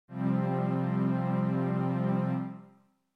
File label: C Suspended4